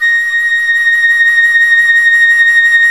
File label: FLT FLUTE, Flt vib; Roland L-CDX-03 Disk 1